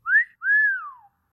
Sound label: cat call whistle